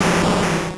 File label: cry